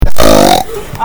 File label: oink